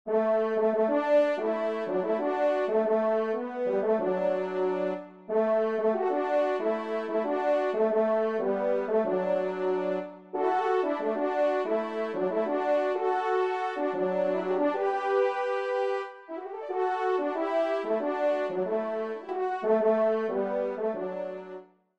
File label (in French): trompe; Comin Thro The Rye; En Traversant les Seigles; Robert Burns ((1759-1796); 2e Trompe